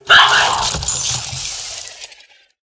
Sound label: Pup Bot, Death